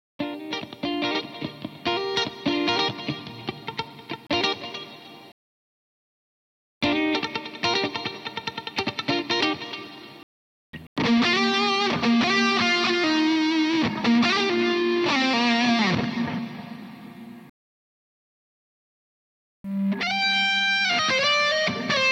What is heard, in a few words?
EG Lead; multitracks; 149 bpm